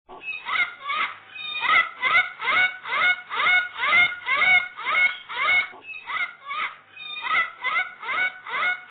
papagei

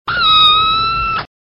adler

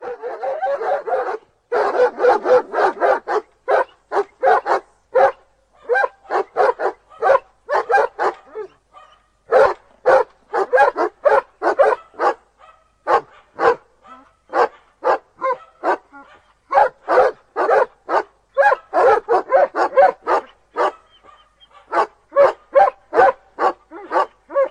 hundegebell

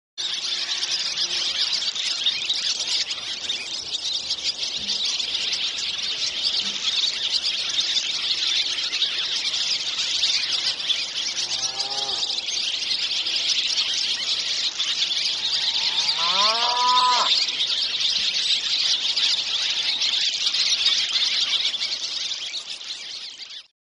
schwalben

